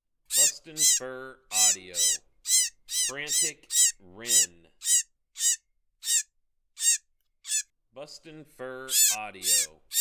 Frantic Wren

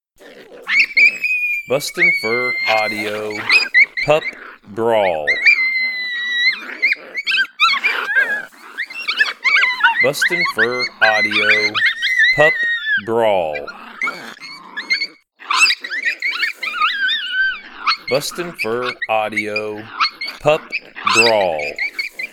Pup Brawl

